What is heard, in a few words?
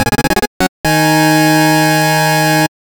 yorokobi, gameboy